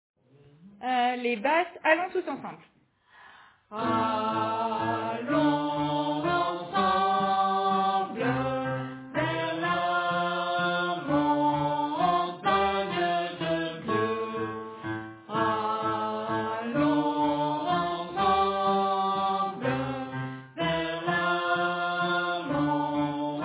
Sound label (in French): Basse